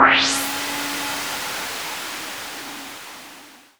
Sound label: SYNTH FX